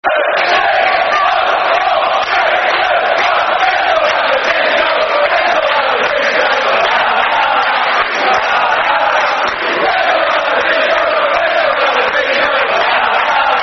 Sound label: Football Chants (Soccer Songs); Let's All Have A Disco; Portsmouth Pfc